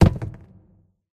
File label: phys cube, physics